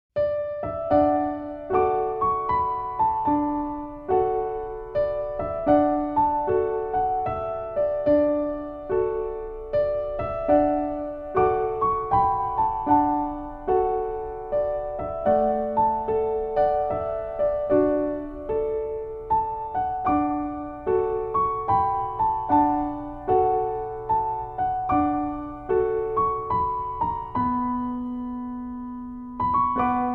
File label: The Last Rose of Summer Piano Instrumental Irish